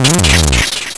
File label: smaaash